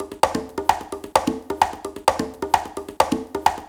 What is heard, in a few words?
Live Percussion, 130 BPM